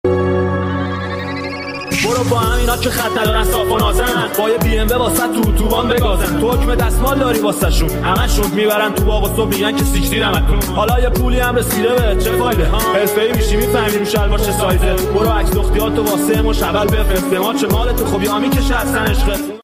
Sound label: Remix